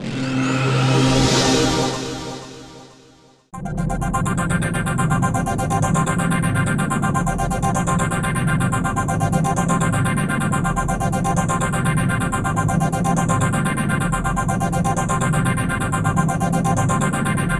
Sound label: Time Warp; gekirindan 1997 saturn gamerip